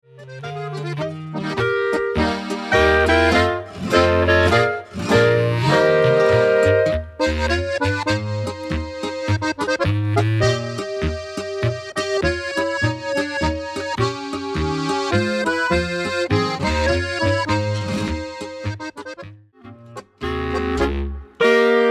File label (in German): Polka